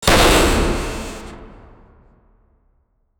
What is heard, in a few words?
a short sound of lose; arena